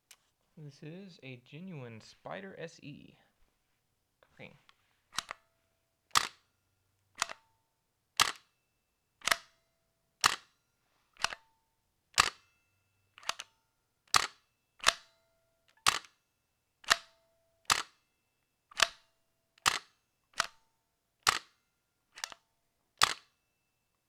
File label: spyder cock raw indoor, guns; spyder se